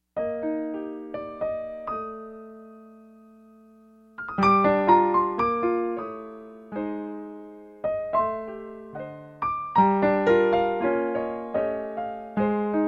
Jazz

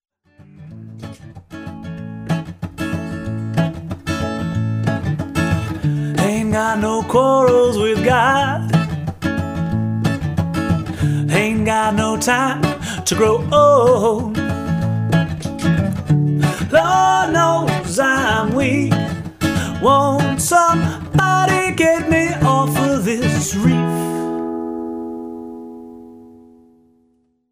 acoustic guitar

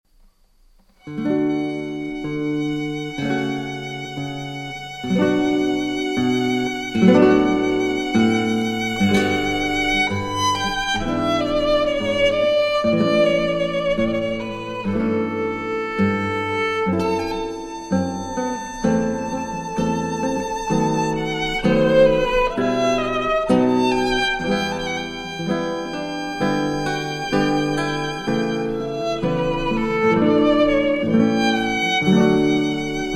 air bach guitar violin

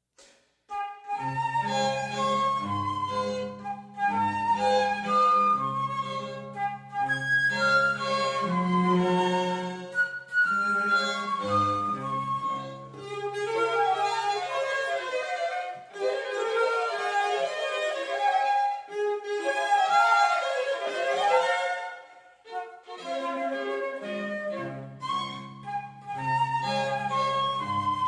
Birthday Song Classical Trio

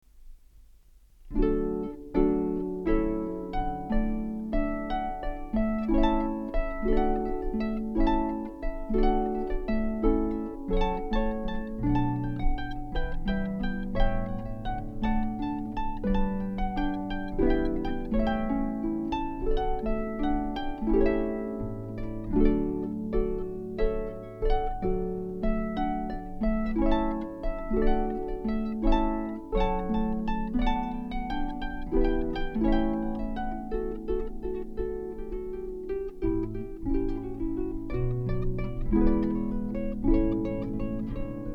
allegro maestoso, Harp

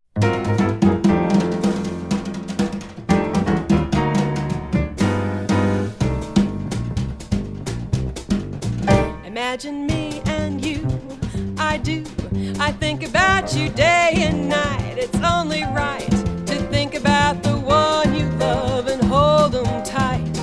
Samba Version, Jazz

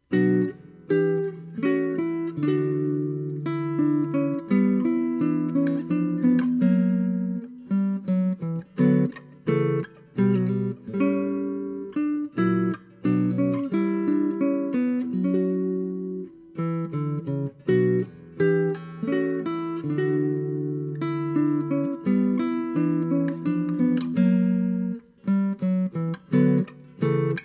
Sarabande in Bm (J.S.Bach), Guitar

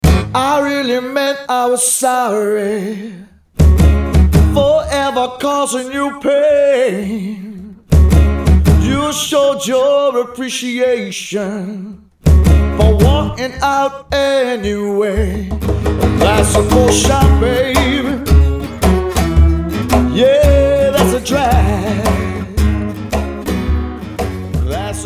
acoustic duo

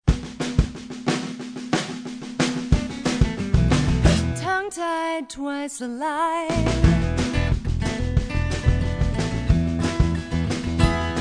Live Band

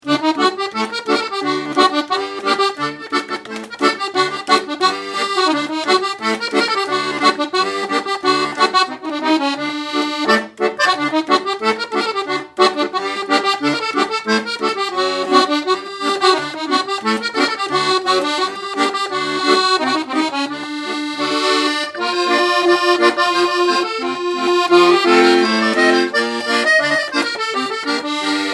Klezmer accordion

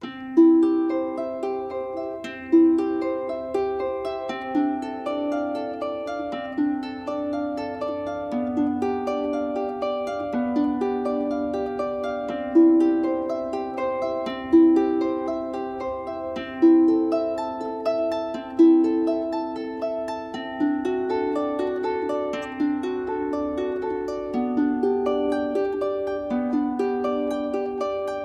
Harp

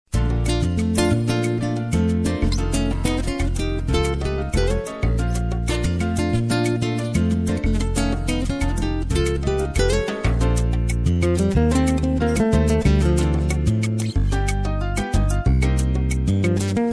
Latin Jazz style

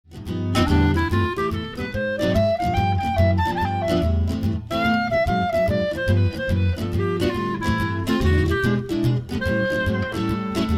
Jazz Duo

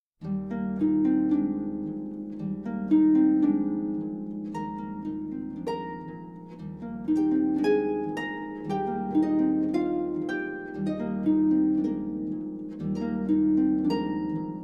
Berceuse, classical harp

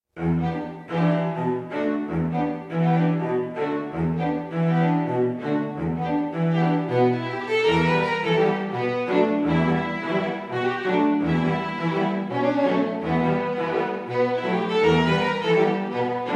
Royal Violins, String Quartet; Black Orpheus

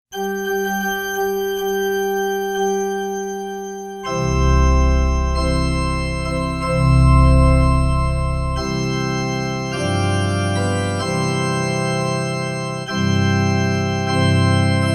Organ, Piano; Bridal Chorus; Wedding March from Lohengrin; Wagner; Laura Cummings

